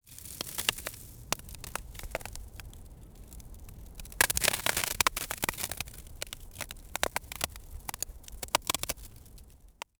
ice spread snow ice

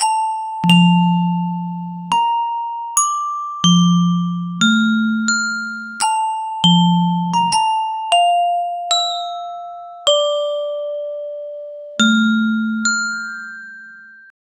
happy tropical xylophone; instrument

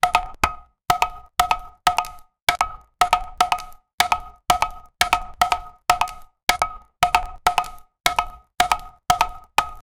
marching footsteps

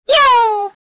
alien vocalizations

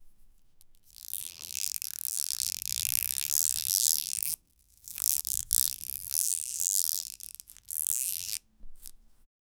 thic and deep sound of, rope

